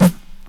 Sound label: SD, dance drums hip